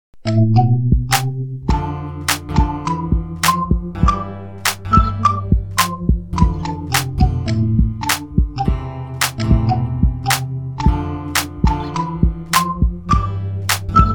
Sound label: background music